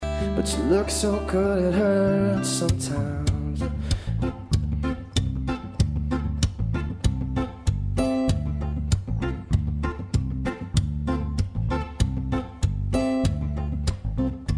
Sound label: Acoustic